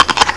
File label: apple crunch